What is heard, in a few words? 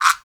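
perc afro, gourd guiro; Roland LCDP14 Africa VOL-2; Afro Toys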